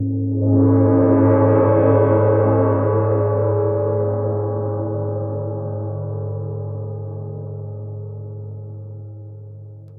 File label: soft gong hit in warm